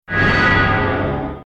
haste effect; ability

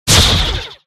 ability disarm cast; abilities

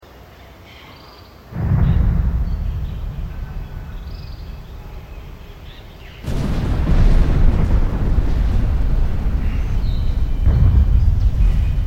ambient bastion

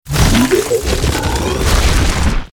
elemental earth death; mobs

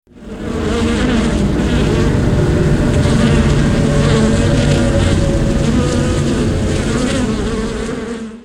swarm effect

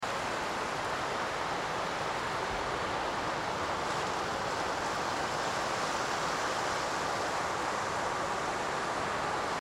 ambient ocean loop

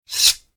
ability sheath

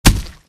impact blunt

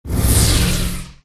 canopener cast; abilities

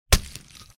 impact blunt

